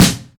piercing snare drum sound f sharp key, Royality free snare drum sound tuned to the F# note. Loud